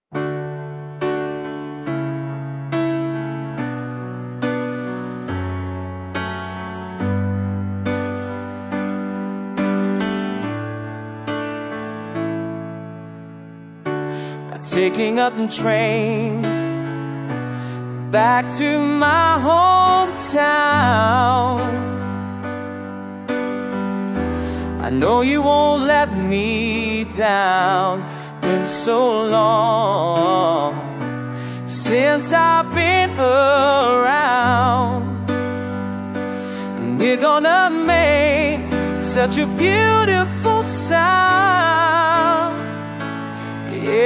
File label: piano mix